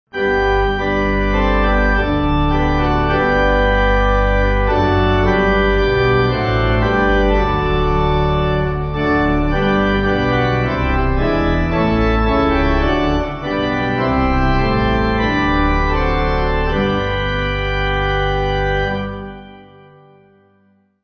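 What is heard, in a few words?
Pipe, hymn backing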